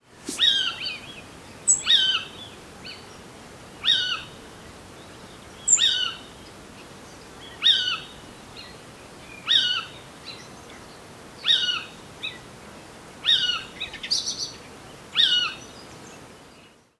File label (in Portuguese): mocho-galego Athene noctua; Little Owl (Athene noctua); Género: Strigidae